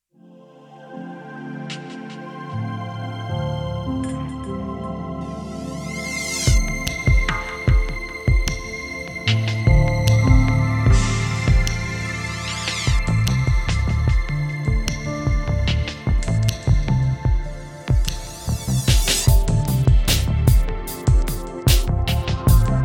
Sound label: 150bpm